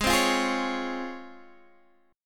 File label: Db Major 7th Ab